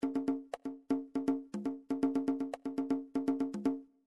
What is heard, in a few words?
individual drums congas, midi